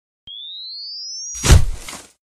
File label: medical defibrillator